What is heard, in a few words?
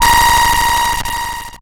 Transition Digital Scanner